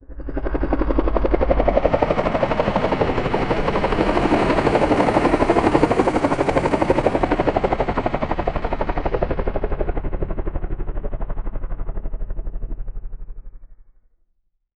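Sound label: helicopter blades whirling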